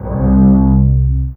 Cello C3; 11LoString

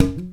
Tube Hi Wah; Planet Earth; Digital Sound Factory Planet Earth

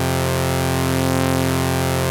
2600 C1, ARP 2600; Hollywood Edge - Giorgio Moroder Rare Synthesizer Collection

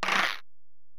Ac Perc, Drum Machines Alesis DM-PRO

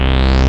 PHUNKY BASS, SYNTH FUNK; Zero-G; Funky Elements

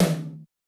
Tom, Yamaha DTXpress II

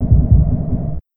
Snare Fill; Sonic Foundry (Sony Creative Software); Esoterik Beatz; PH7 FX

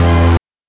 BANK2 SFX, NES DPCM; MIDINES

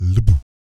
VOC PERC, Vocal Planet Jazz FX BASS PERC

